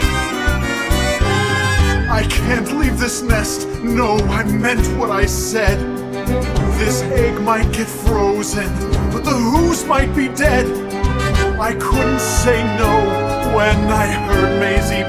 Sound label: vocal